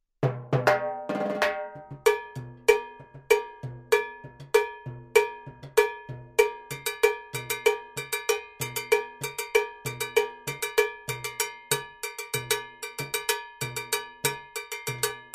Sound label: LP cowbell